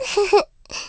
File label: Giggle; Beth giggling